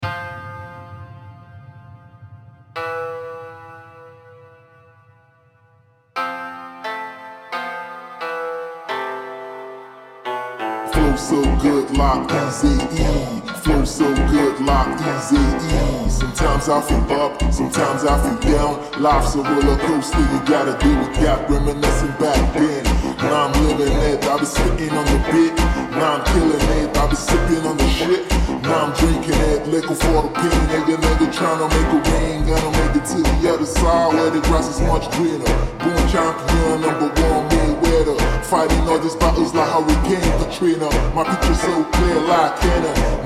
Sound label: brazilian funk slowed